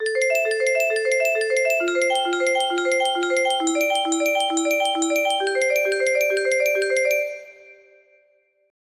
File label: melody, music box